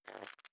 wraith spark; Stellaris; guardians

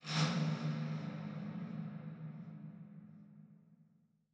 abandoned ship stinger, ambient; Stellaris

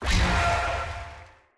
sfx alert; cradle of souls; Stellaris; shroud

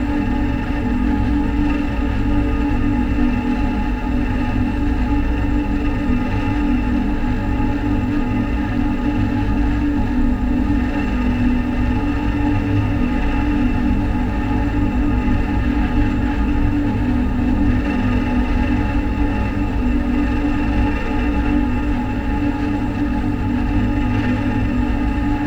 construction ship hum; nemesis; Stellaris

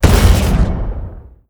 xl arc emitter fire; weapons; Stellaris

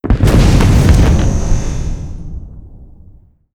planet killer hit; apocalypse

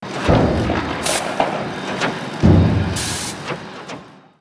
event construction; Stellaris

